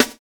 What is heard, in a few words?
NATURE SNR; BONUS SN2